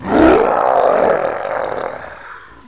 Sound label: Smoker Tongue Hit drag; zombie plague